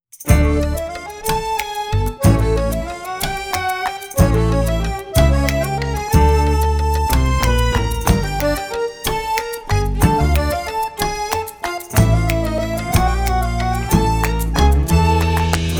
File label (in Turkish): Komik Vals